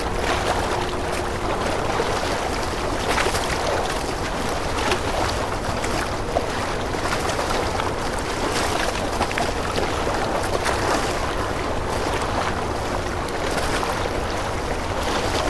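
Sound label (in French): Mer clapotis dans petit port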